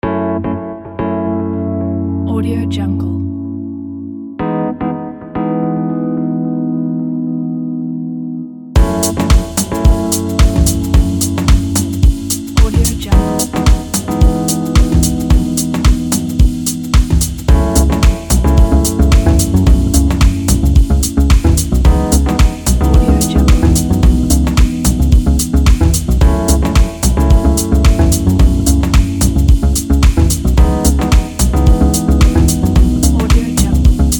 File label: luxury jazz